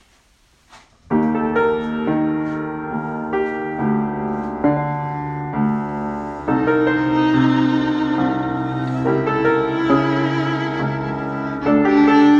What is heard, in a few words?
Adagio in G